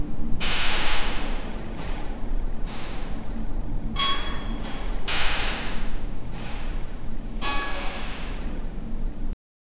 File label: cinematic ambience of an, warehouse